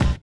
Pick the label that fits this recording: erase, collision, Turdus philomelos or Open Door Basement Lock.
collision